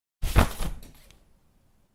umbrella opening sound

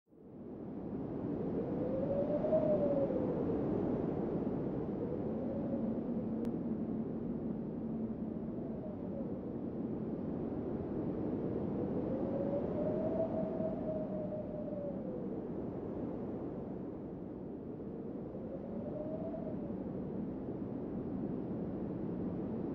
Desert sound effects

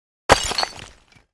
Ceramic Smash

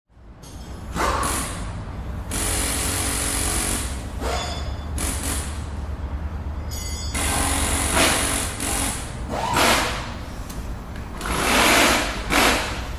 Auto Garage Ambience

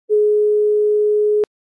call ended

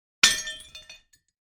Ceramic Smash

